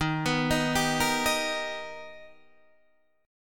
B Augmented Major 7th D sharp, chord

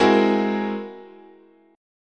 Ab Augmented 9th, chord, guitar

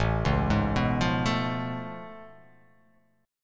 A Augmented Major 9th A down

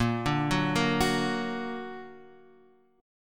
Bb Augmented Bb, Chord; arpeggiated; JGuitar

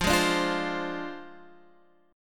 D-Minor 7th Flat 5th F, chord

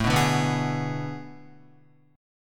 A Major 7th Suspended 2nd Suspended 4th, Guitar; strummed; JGuitar

